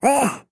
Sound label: China Sad; cut the rope time travel; android